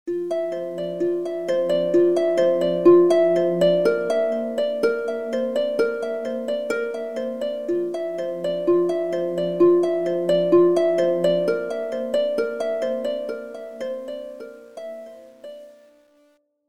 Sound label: Fairy Tale, ringtones; Alcatel OneTouch Pop C1 OT 4015